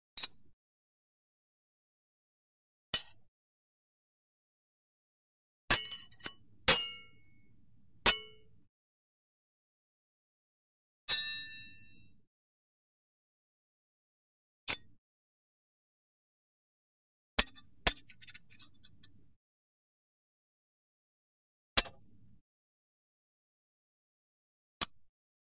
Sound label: Sword Fight